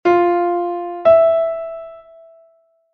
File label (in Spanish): 7ªm Fa3 Mi4; intervalos musicales; ¿Qué intervalo suena?